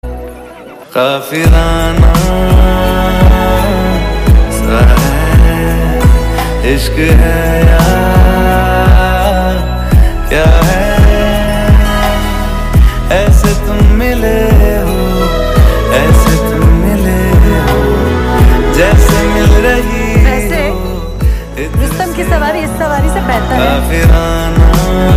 bollywood ringtone

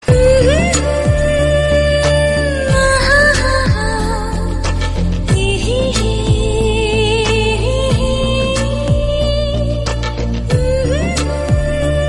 latest music ringtone, bollywood ringtones

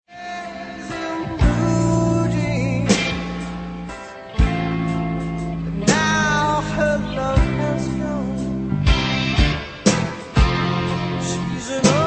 english song tone ringtone

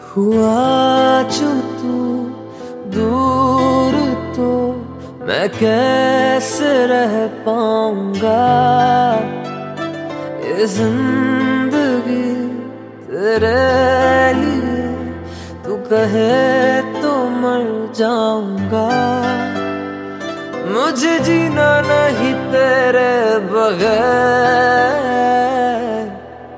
ringtone bollywood ringtones

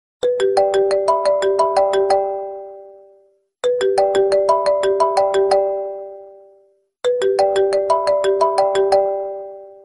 samsung ultra 24 ringtone

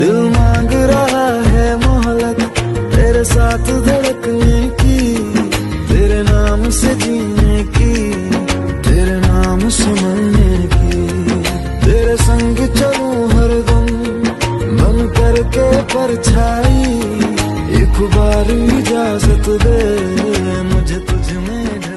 romantic bollywood ringtone

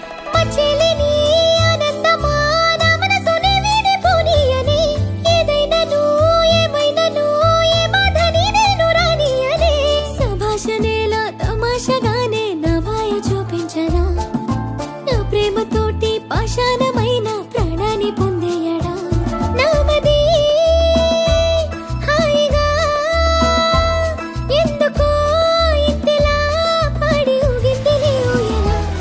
telugu song ringtone

